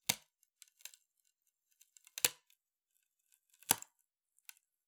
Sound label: Palette, WoodenPalette